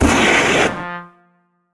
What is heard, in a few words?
trombone; rainblower; tf2